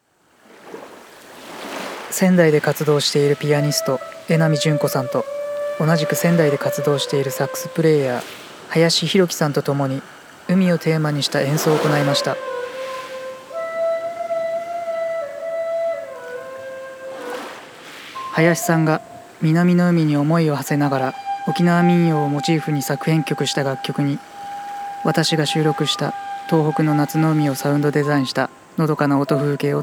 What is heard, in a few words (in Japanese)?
音旅海編ナレーション入り